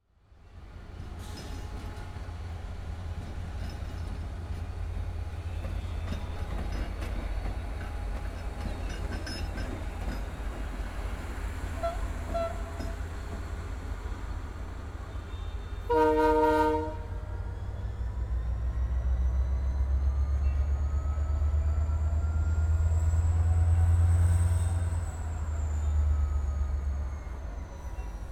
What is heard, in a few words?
Train station exterior; Travel & Transport